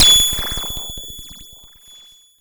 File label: High Hit, Cwejman S1